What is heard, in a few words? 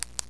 flashlight; svencoop; echoes